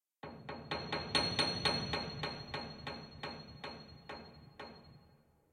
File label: piano notes; SyrinScape; Madness in the library